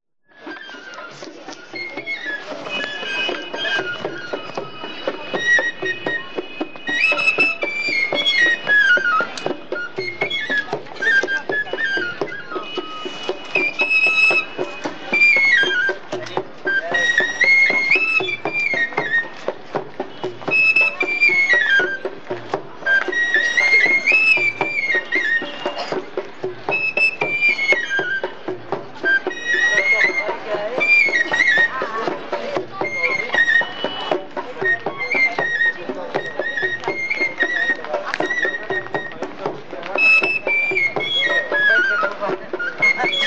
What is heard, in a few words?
Street Musicians In Ram Jhula Rishikesh, Field Recordings From India; Field Recordings From India Nepal; Jani Hirvonen